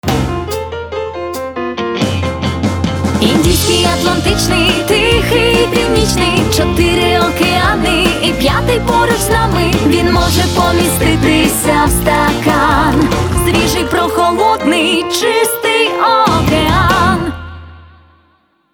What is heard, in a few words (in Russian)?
Джингл Океан